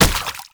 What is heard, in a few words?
Proto Infested Step Water; Enemies; Steps; Warframe; Brachiolyst